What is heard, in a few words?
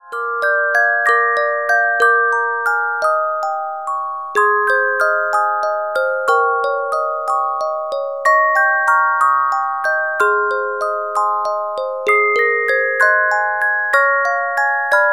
Carillon Music Box Melodies